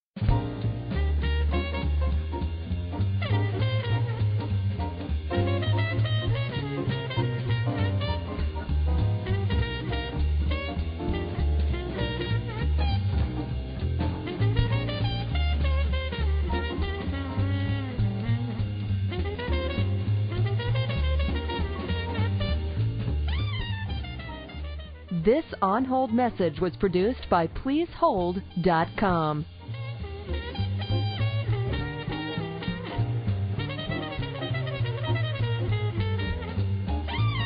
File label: Easy Listening Jazz Swing